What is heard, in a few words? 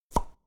Plop enhanced